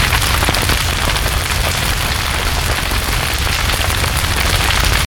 Fire Small; Prison Architect

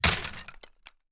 wood break

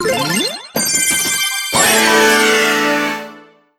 Treasurebox Tier1 open; SFX Endcontent; Cookie Run Puzzle World

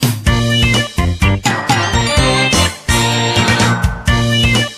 Jingle Clear; Taiko no Tatsujin DS Dororon Yokai Daikessen

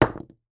masonry hit; teardown; ps5 windows xbox series xs

